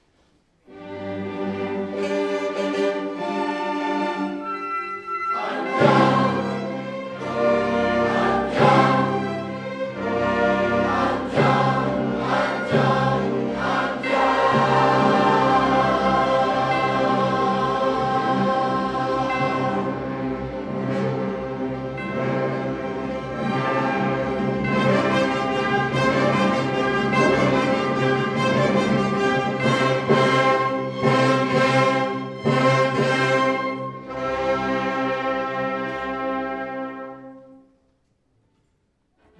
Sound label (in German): Glockenchor; I Pagliacci; Jugendkammerchor Ahrensburg; Sommerkonzert 2011